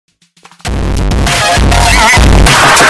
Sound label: default dance bass boosted; fortnite